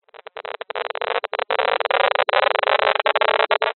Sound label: science fiction radio signal interference burst